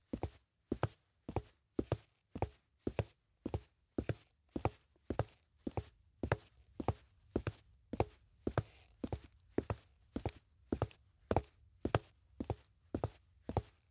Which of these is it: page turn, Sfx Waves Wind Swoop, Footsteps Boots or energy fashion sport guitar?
Footsteps Boots